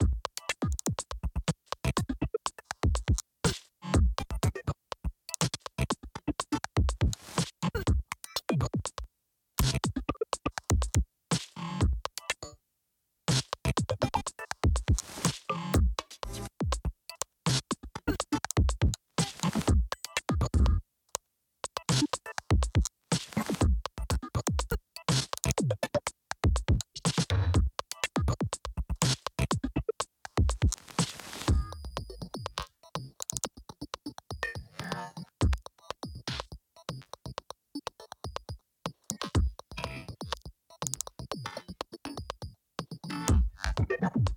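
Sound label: music stem beats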